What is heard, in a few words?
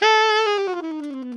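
ALTO long fall A 3, Saxophones; Greytsounds - Philip Wolfe David Crozier's Saxophones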